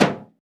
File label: Dofs Sak, Roland BK-5 Percussion